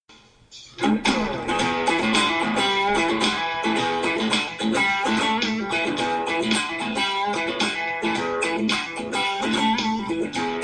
funky rhythum lesson, guitar

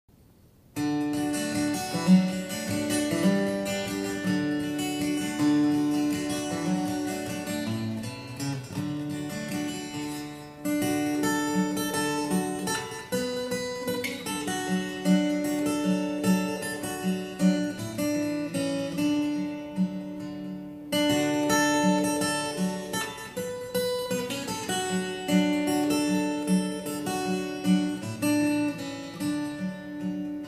fingerstyle

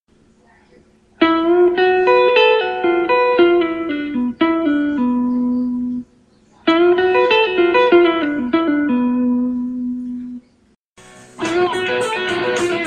rock lick in b

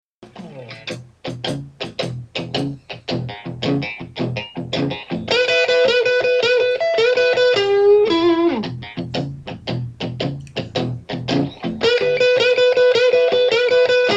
fake slide guitar lick

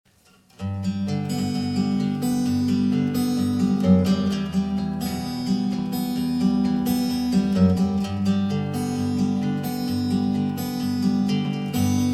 fingerstyle, guitar

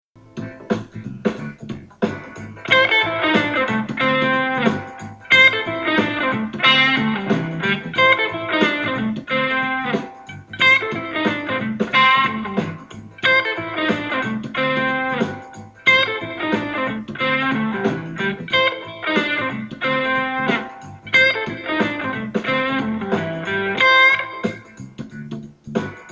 funky a minor blues scale lick

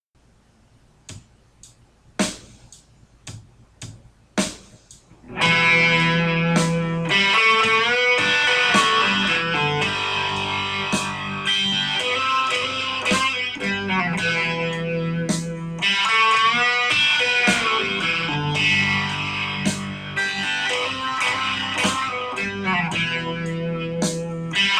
style lick

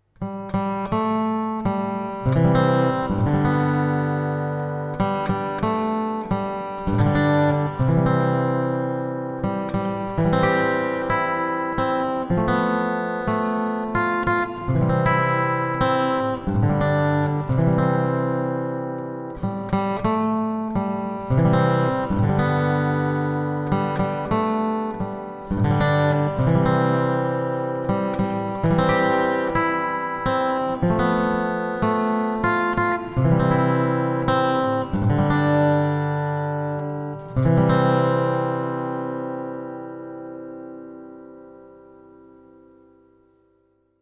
flatpicking

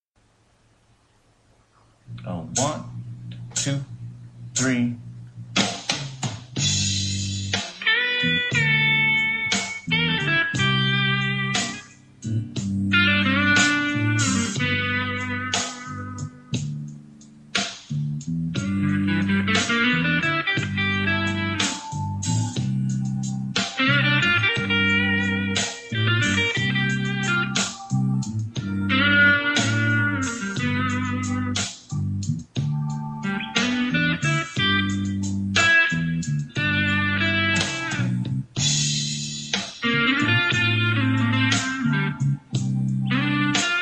major minor solo example

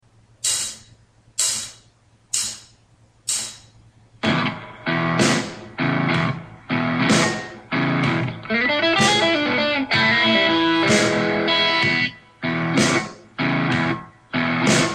dirty blues in e

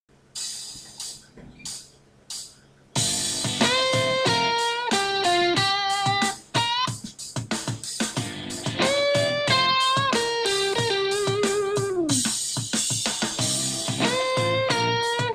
soulful blues lead

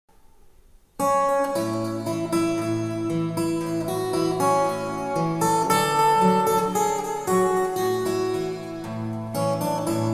fingerstyle, guitar

